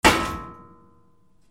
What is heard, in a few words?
HOUSE